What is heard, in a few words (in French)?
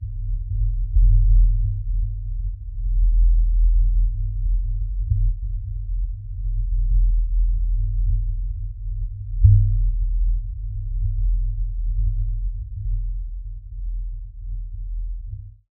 Contact Sol, Petite Ceinture